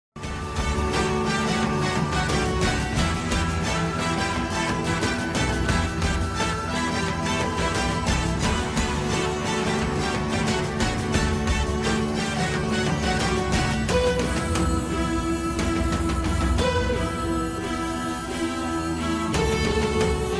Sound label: ringtone